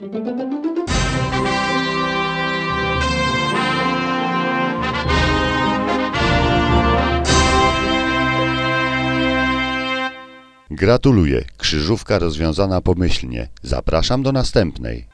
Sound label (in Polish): fanfary